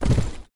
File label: Ashes Butcher move